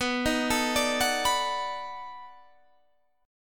B 7th Sharp 9th, down, Guitar, Drop D

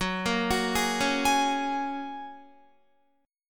Gb Major Add 9th, down, Guitar

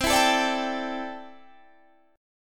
C 6th Add 9th C down Guitar Open G

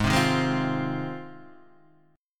G sharp 6th Guitar, chord; strummed; Standard; JGuitar

